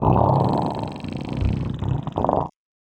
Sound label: MUDRAPTOR pet Happy